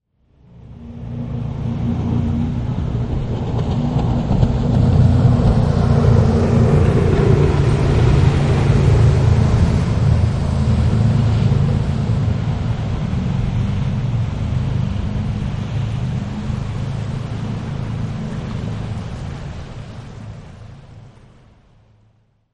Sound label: Jet Boat; Boats; Vehicles